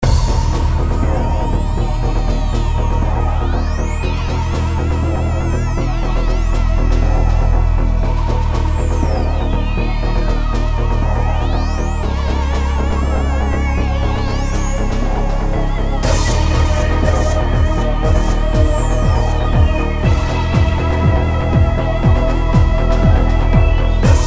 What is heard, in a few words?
bossfight; Cosmic Defenders